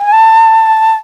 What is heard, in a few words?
D.FLUTE; FLUTE MIX; E-Lab; X Static Goldmine 2; akai